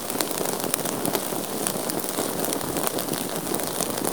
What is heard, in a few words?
fire small loop